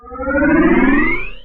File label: machine power on